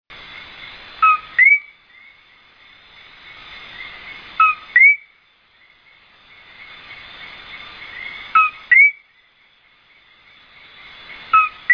populations that are found on the high mountains emit calls which are louder, longer in du; eleutherodactylus coqui; el yunque national forest puerto rico; West Indian